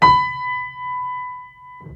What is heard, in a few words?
key, pianosaurus